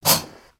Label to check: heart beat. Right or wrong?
Wrong